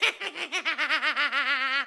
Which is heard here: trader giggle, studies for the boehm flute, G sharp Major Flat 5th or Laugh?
Laugh